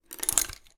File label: office paintbrushes artists x5 scoop pick up from surface